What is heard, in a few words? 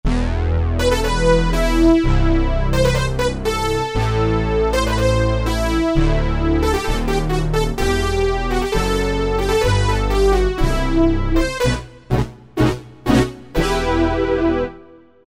Synth Fat, Korg 05RW